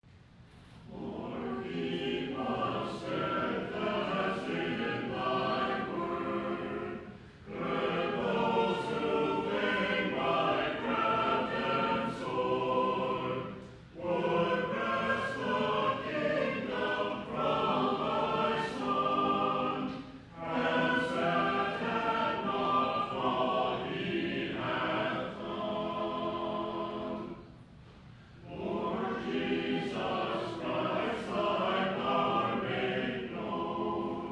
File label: Choir Bach